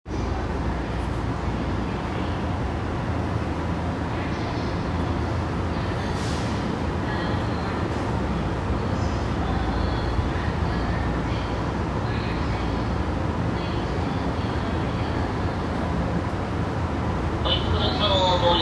東西線中野東

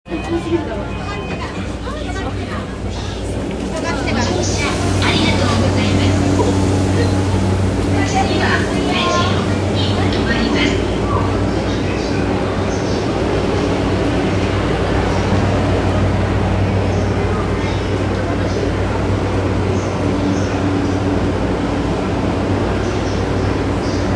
JR 山手線 池袋 CCW, train sounds

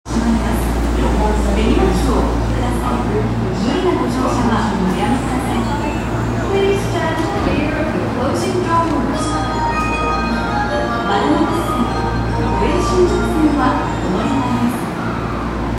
副都心線新宿三丁目北

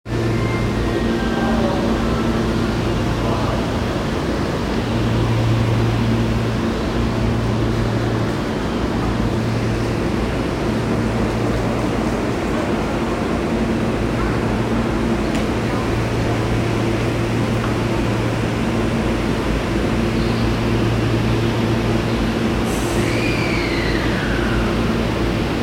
池袋線所沢西, train sounds; 西武

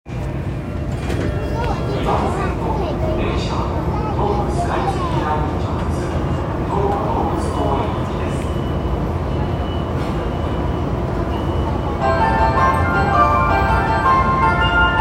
日比谷線茅場町北, train sounds

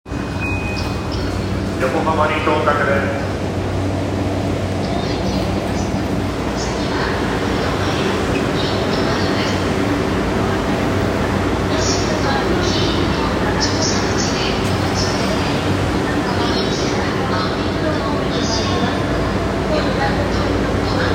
JR横須賀線横浜北, train sounds

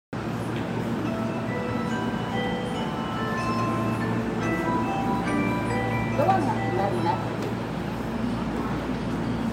発車, train sounds; 新今宮

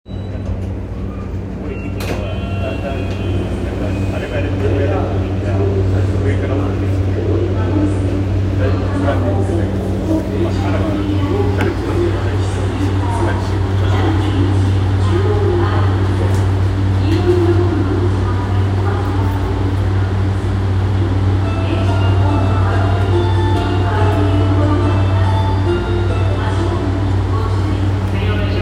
半蔵門線三越前西, train sounds

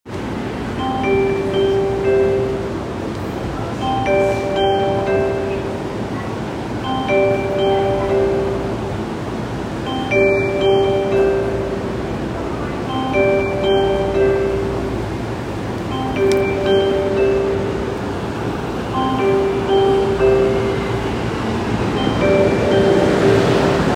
JR横浜線橋本北接近, train sounds

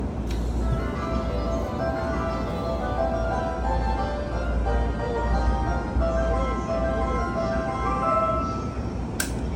京浜東北線田町北, train sounds

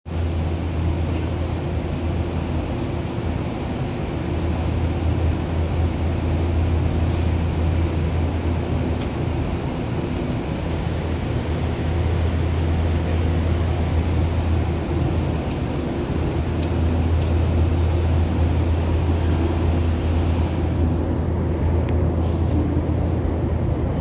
東西線東陽西, train sounds

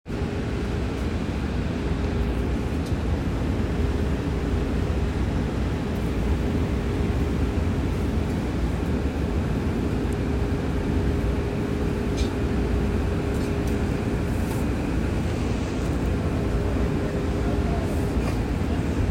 池袋線西所沢西, train sounds; 西武; 西武池袋線西所沢西

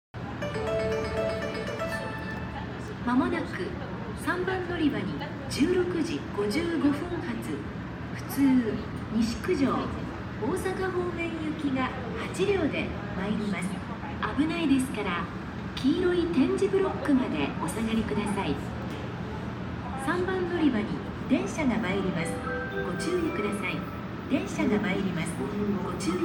大阪環状線接近メロ、野田で録音

